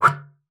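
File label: C#4, bass flute; Xsample VOL-2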